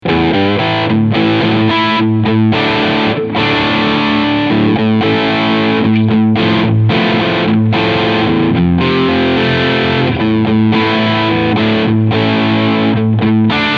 Tribute S-500 Overdrive Sound Steg Singlecoil

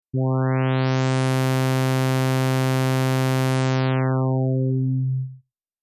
Filterverlauf

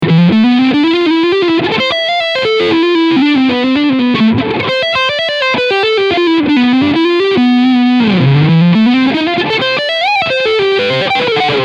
Jackson Pro Soloist SL2Q E Gitarre Overdrive Sound beide Pickups

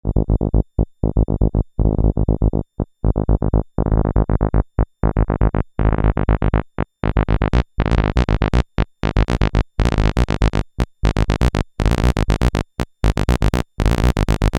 Analog Solutions Ample Bass Sequenz pushed by Note Zero

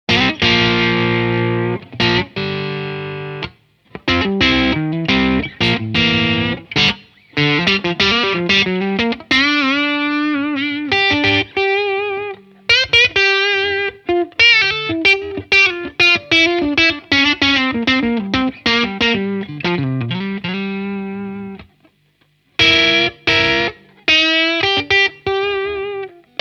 T7 Hals angezerrt; Larry Carlton

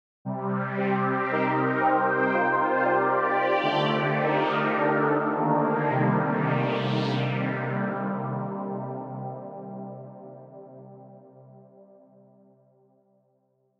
Unisono

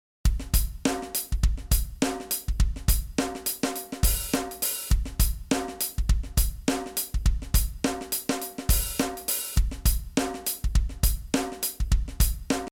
Loop mit Master Q

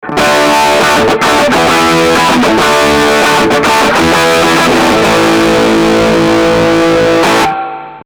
32 Bit Float E Git Maximum Preamp Gain

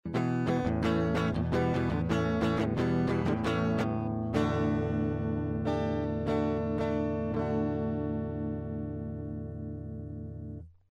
Gitarre über Hi Z; Centrance MicPort Pro 2; 12dB

